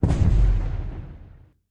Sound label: Meteor Land Far; Lethal Company